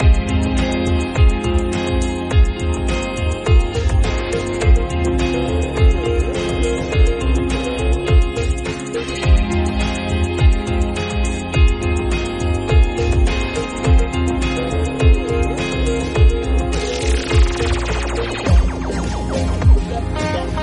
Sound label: romantic